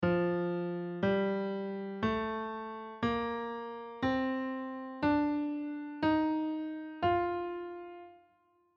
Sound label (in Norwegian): mixolydisk